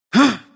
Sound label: gasp